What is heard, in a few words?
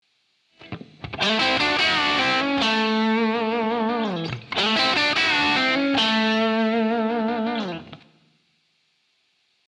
lick, blues guitar